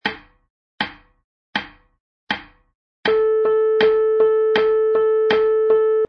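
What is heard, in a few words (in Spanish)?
corcheas, piano midi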